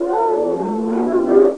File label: MOAN; duke nukem total meltdown